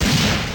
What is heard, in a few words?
explosion; Alien-Galaxy-War